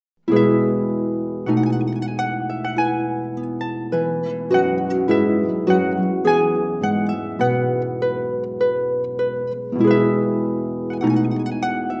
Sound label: serenata trumpet voluntary